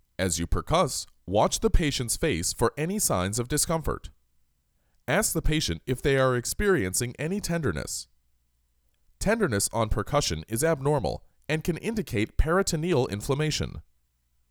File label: Percussion; Ab Percussion